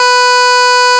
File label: Clavinet D#3